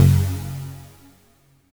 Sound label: NOISY Db2; Jack Sparrow Deep Dubstep Progressions